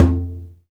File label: PRC CNGA, Congas; Roland - Rhythm Section; Latin 1